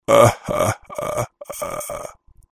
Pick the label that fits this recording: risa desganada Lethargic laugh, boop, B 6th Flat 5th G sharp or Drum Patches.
risa desganada Lethargic laugh